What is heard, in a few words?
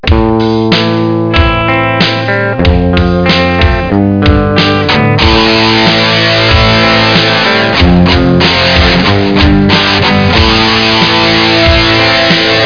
lead